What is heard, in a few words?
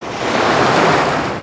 wave